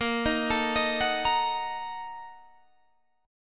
A sharp Major 7th Suspended 4th Sharp 5th, Guitar, Standard; arpeggiated; JGuitar